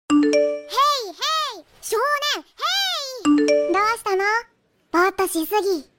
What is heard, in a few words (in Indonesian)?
Nada Dering Anime